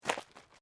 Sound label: FOOTSTEPS SNEAKERS UNDERBRUSH STEP; Footstep Foley; Footsteps (Sound Effects:Human)